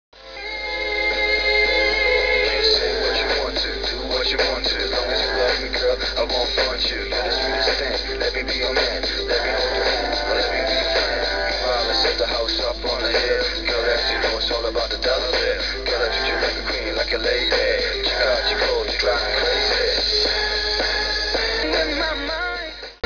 RAP

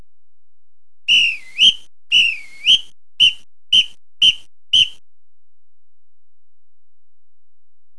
Whistle

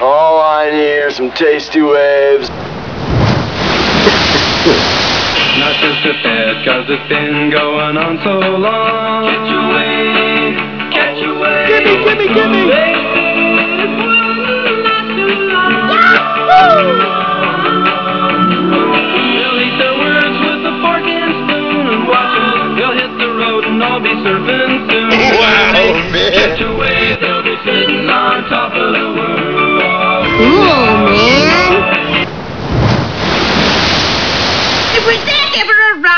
waves

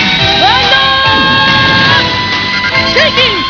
shaking

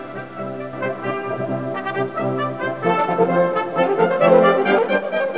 j-bells; Christmas Spirit

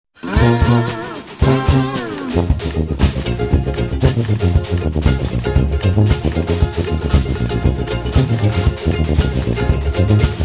Rhythm

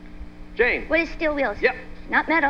metal; Second Metal Response

